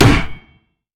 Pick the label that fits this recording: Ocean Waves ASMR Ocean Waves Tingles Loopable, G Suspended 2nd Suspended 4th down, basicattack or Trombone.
basicattack